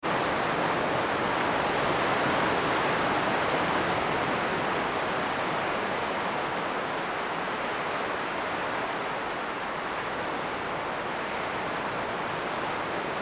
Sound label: wave